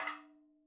madalam notes L; MADALAM 110 7 8; Sample Banks; Percussion; MADHALAM

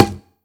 Perc; Sample Banks; Percussion

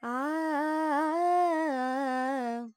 Pentatonic, Vocals, Carnatic Female, Alaps, Dry; 70 G; Sample Banks

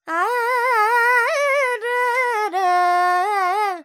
Dorian, Vocals Carnatic Female Alaps 70 G Dry

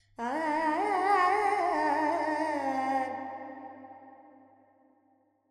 Carnatic Female Alaps, Lydian, 70 G, Verb; Vocals; Sample Banks

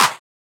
Clap, Percussion; Sample Banks

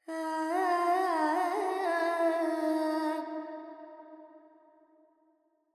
Verb Phrygian, Vocals, Carnatic Female, Alaps; Sample Banks

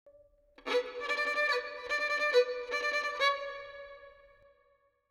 violin verb 4-4 Bm 70; Melody; Sample Banks

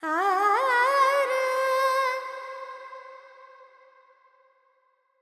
FX, Vocals, Carnatic Female, Alaps, Charukesi

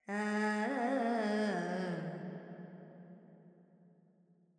Pentatonic, Vocals Carnatic Female Alaps 70 G; Sample Banks; Verb

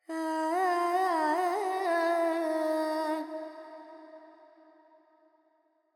FX Phrygian, Carnatic Female Alaps; Vocals; 70 G; Sample Banks

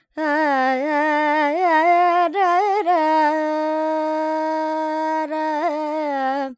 Lydian, Vocals, Carnatic Female, Alaps, Dry; 70 G; Sample Banks

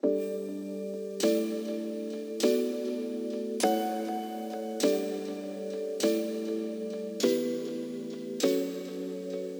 Piano Delay Emo Dm 100; Sample Banks; Melody; Keyboards

